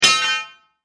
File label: Sports Barbell Drop Impact Metal Weight; Weightlifting; SFX Bible